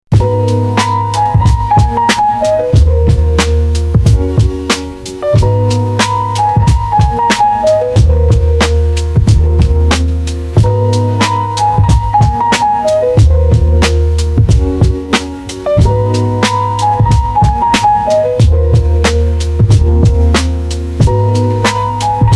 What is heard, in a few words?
morning mist, lofi